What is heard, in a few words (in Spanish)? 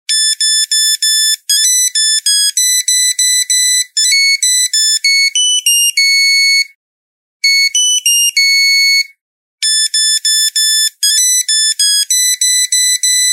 melodia